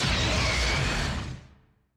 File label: Special Move Gauge Up